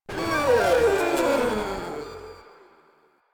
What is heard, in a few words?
Proto Infested Runner Death; Warframe